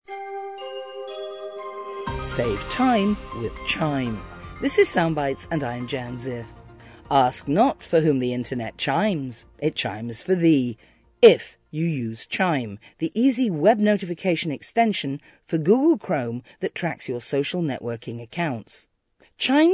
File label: chime